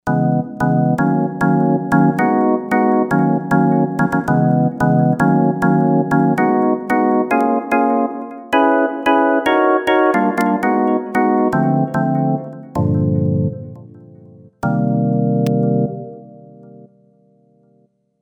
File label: 1 Fingr Org